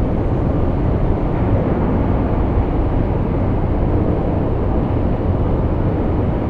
interior; ambient; Counter Strike Model Pack; CS:GO; nuke

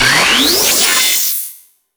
eblaster charge leds, energy pistol; weapons; Half Life Alyx

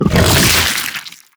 bite attack, barnacle creatures; Half Life Alyx

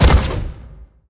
step backup hand, dog; npc; scripted

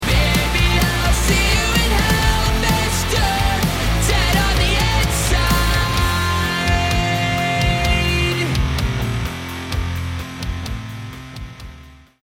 round mvp anthem, music

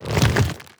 step movement, zombie blind, foley; creatures

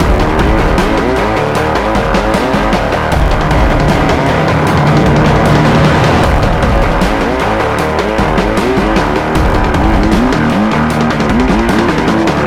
smash, music

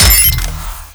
target hit success, thumper

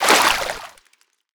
water exit; player

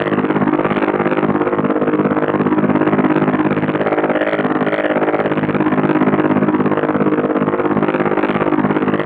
loop engine, manhack; npc; Half Life Alyx

